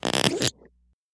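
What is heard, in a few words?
Creaky Squeak Small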